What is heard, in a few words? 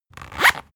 zipper close harsh